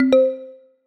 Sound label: notify; chat grid